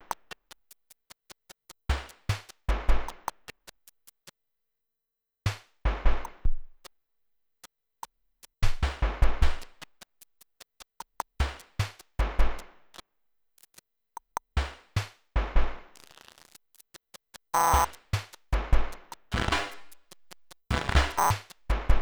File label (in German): Drill Variationen; Crazy8eats